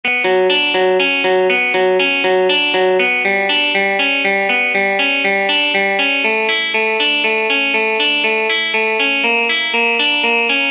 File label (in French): etude arpeges aller retour deux notes par corde